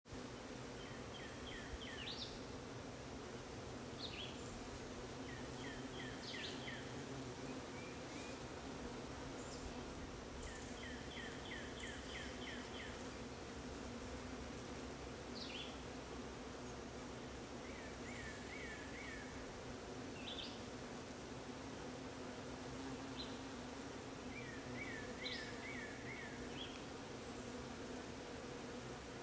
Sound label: bees in crepe myrtle; Bees worshiping Christ in Crepe Myrtle